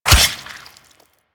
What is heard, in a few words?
Melee Sword Impact Flesh; Warframe; Weapons; Swords